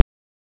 ultra short 8 bit click

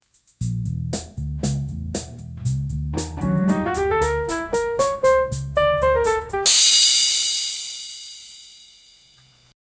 the sound of a jazz

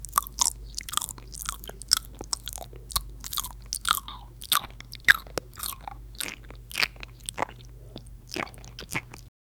a close up macro shot of, mouth

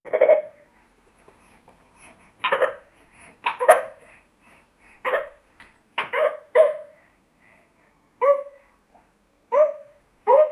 pet noises barking meowin

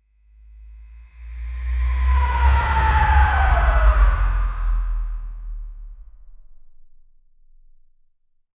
a low pitch dramatic orch, build up

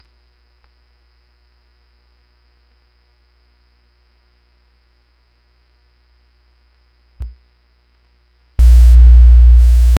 retro crt screen startup; electronics

